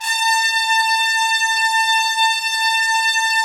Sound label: Trumpets, BRS TPS 2KL; Roland - LA Composer vol. 4